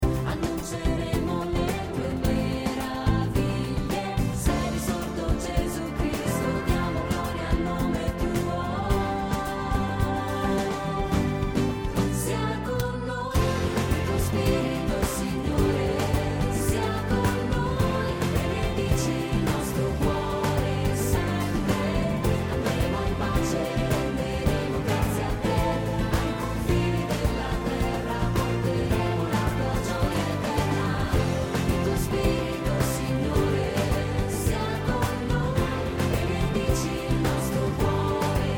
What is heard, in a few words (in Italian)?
sia con noi il tuo spirito violino